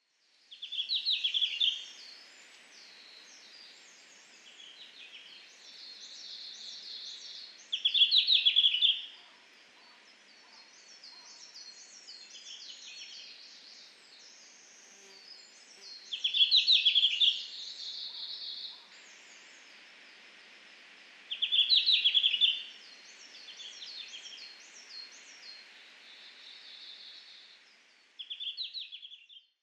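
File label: red-flanked bluetail, fuji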